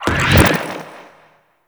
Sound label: Staff Jab Cast; Warframe; Monkey King